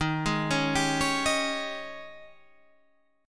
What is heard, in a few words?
G sharp Major 7th Suspended 4th, D sharp